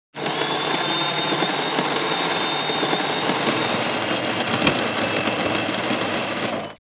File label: Coffee grinder noise; appliances